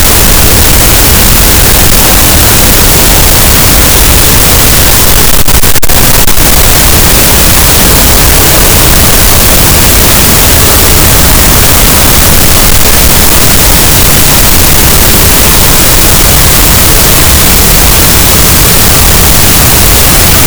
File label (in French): cachalot, gaussian noise; Physeter Macrocephalus